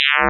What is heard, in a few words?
analog